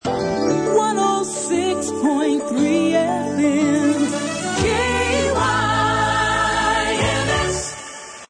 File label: Jingle; KYMS